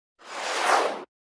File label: Synth Spaceship By Fast w Action Panning From Left To; Electronic Sci-fi